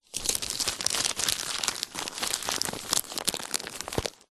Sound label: household magazine thin freebie light movement rustle; zapsplat